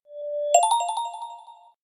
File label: Joyful, notifications